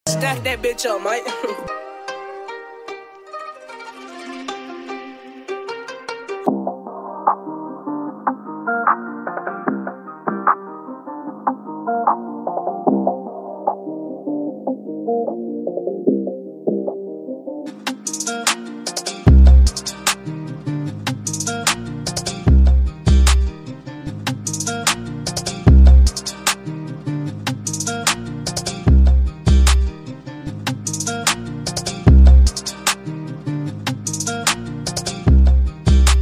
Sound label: Instrumental